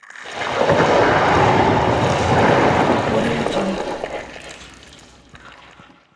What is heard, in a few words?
hydra tentacle movement dist; Monster Within; soundmorph